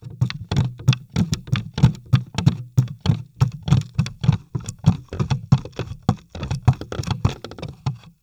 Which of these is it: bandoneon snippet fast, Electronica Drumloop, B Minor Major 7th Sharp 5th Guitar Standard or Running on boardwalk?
Running on boardwalk